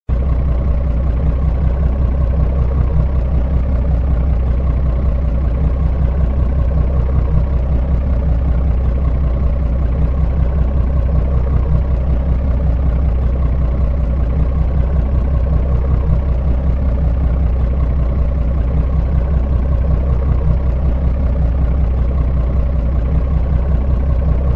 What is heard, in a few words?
Fishing boat engine - idle sound; motor boat